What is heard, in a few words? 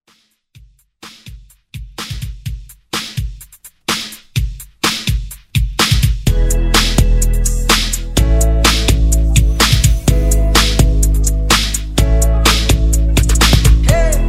Xmas Redrum